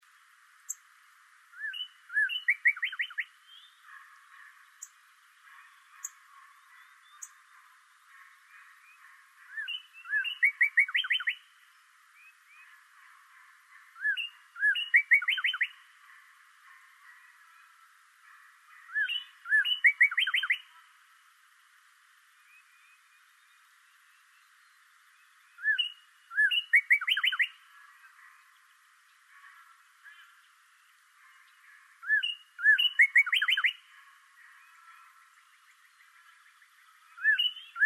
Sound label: bird